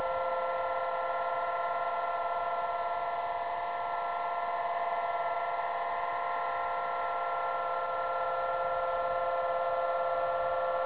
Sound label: harp